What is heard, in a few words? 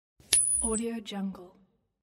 coin flipping